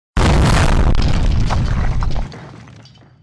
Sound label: sound explode